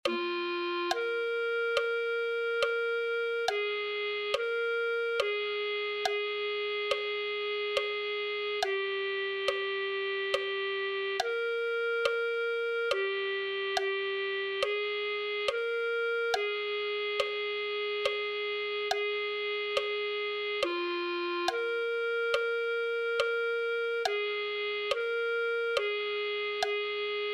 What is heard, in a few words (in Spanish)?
clarinete